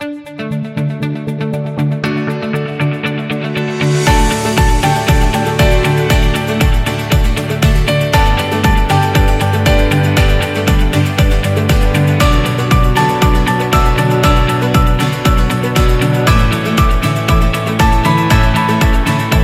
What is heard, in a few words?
Confident; business background